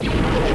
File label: Imp Turret Fire; star wars trench